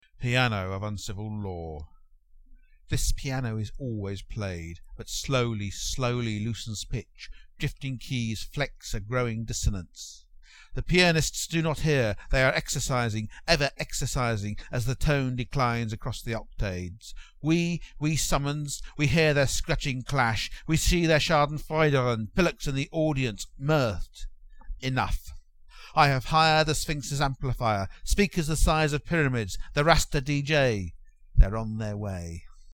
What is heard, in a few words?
piano